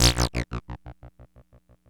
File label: piton electro, free techno acid samples